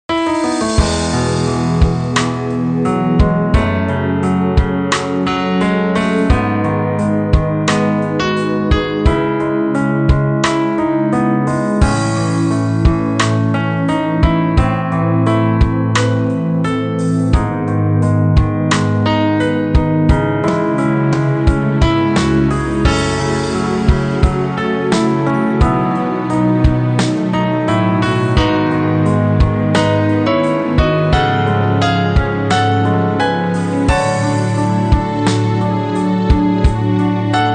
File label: Music Success event